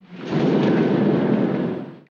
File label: Elevator Door Slide, elevators